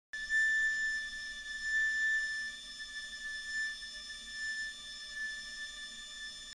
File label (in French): la do, galoubet; Note Do - 3ème interligne